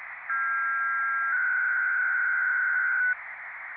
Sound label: psk 2